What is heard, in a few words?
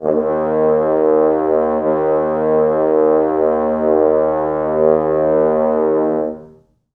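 4 French horns sustain D#4; East West; Quantum Leap Horns Sax; 4 FrHorns SUS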